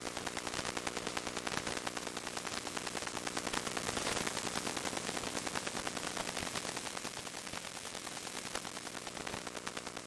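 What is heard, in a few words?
sound from electromagnetic frequencies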